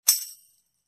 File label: Tambourine Hit